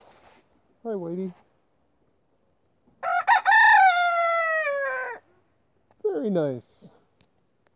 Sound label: cockadoodle doo; whitey